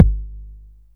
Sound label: Kick, Akai MPC500; Toolkits